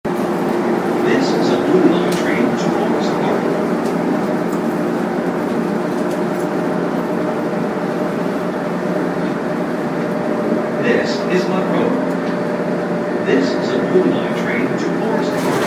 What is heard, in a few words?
Blue Line Train